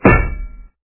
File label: block weapon